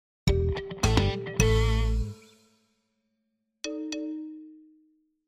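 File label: virgule xylophone marimba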